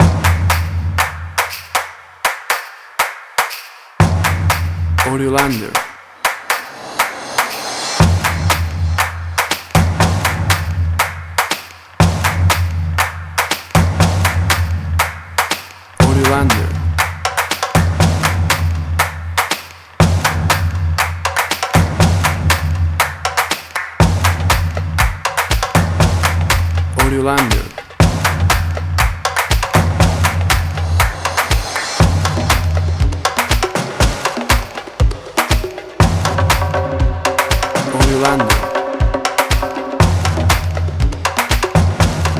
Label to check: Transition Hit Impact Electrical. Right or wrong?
Wrong